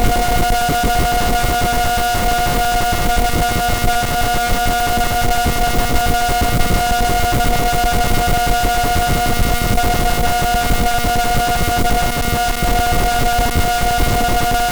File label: scanner